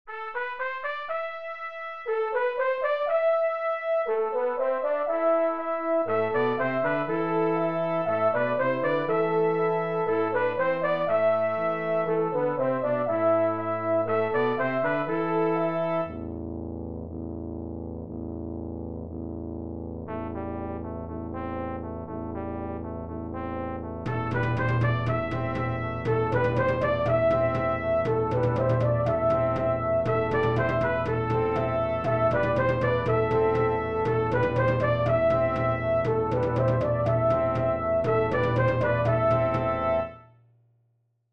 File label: aeolian round for brass